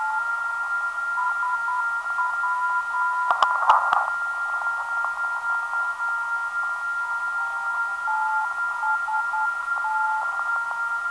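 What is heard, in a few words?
375kHz, non-directional beacon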